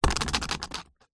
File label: leisure game spinner plastic on card push spin short